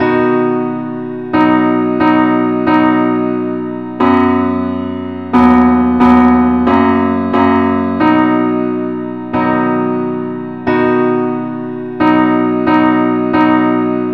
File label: parts only key b